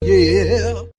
"yeah" sung

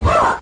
"ah" 8-bit arcade lady, Voice; ooh ahh

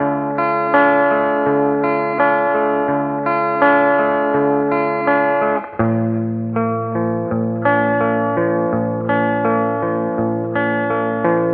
guitar, Jazzmatazz loops

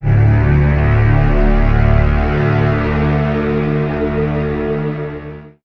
low strings; Notes

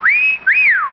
whistle sexy

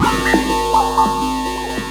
drizzle; Zaps and Beeps

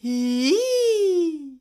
eee cartoon, voice; ooh ahh

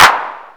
Clap n Snap, Snares

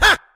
huh male, Voice

